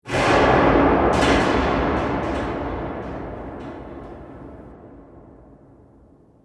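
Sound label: Ambience Airvent Scuffles; Quadshot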